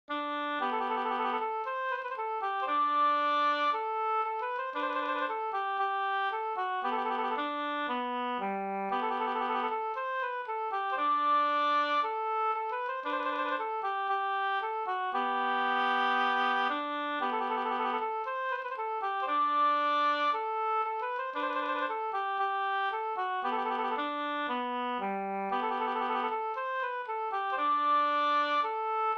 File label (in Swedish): brudmarsch Julita nya brudmarsch; A Linander